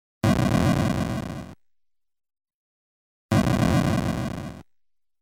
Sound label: Used Dynamite Sound; Kaijuu Densetsu The Tale of the Shell Monsters